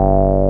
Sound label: TB BASS